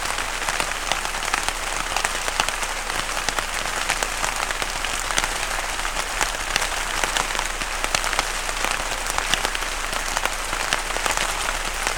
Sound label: Forest Rain